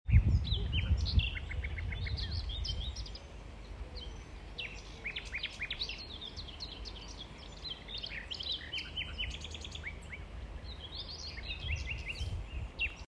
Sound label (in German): Nachtigall